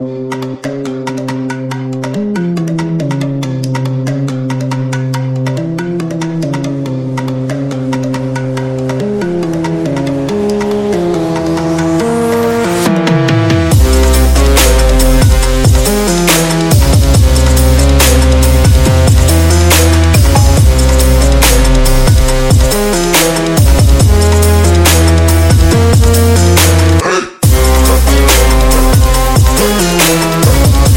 Oriental Trap

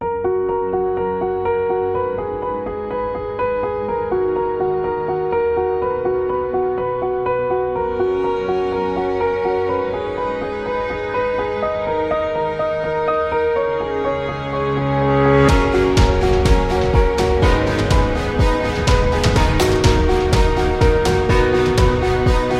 The Motivational

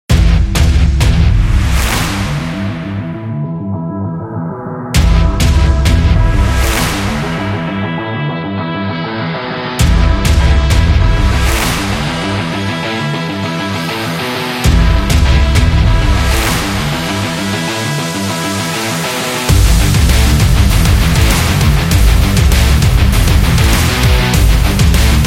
The Hard Rock

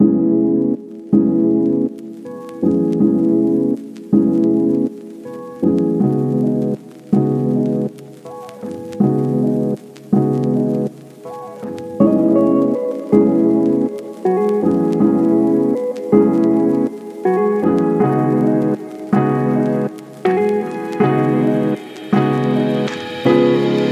Calming Soul, Lofi Chill Hop

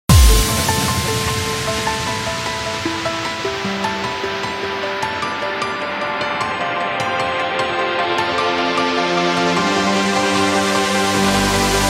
Symphonic Metal

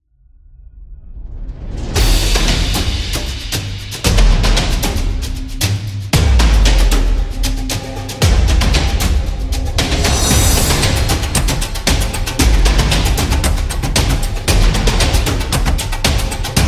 Epic Fight

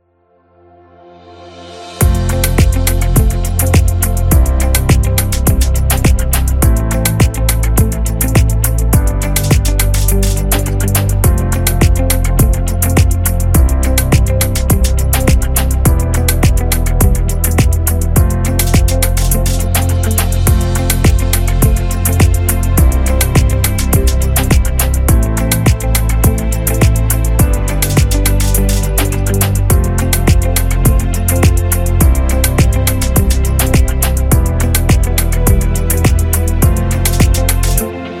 Uplifting

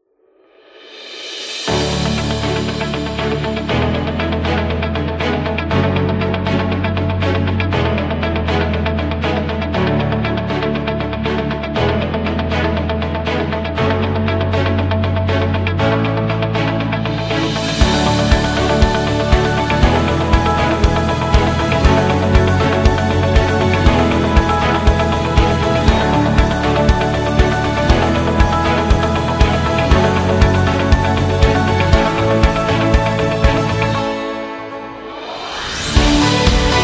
Dynamic Upbeat Motivational; friendly

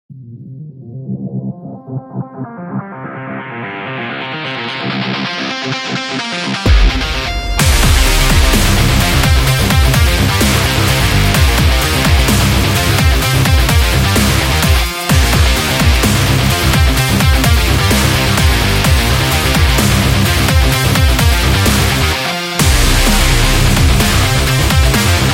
Dubstep Sport Extreme

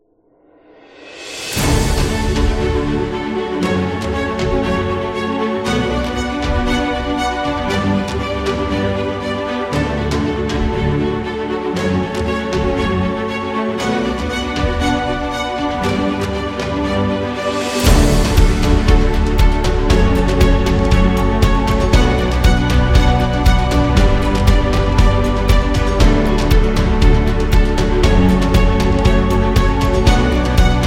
Orchestra Motivational; educative

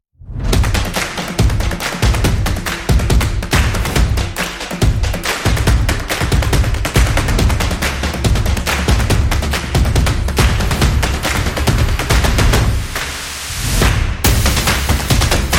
Upbeat Spot Percussion; action drums percussion

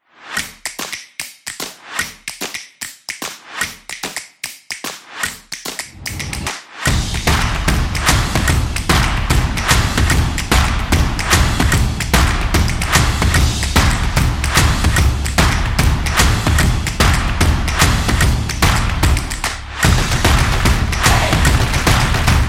This Is Clap Motion

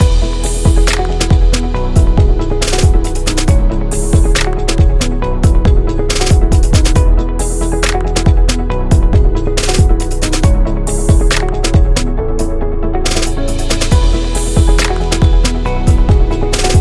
Corporate Loop Upbeat Business; service business

